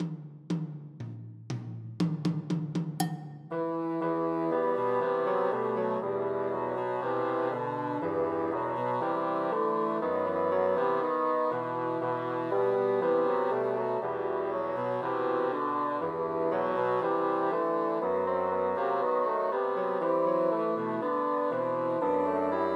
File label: God Rest Ye Merry Gentlemen Christmas Trios for Three Bassoons